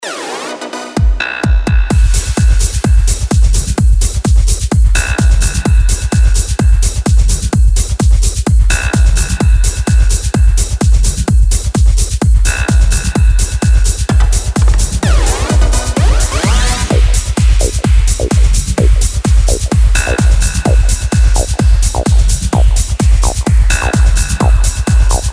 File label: Chord Growl 128BPM B; Franz Johann